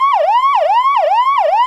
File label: melee; citizens arrest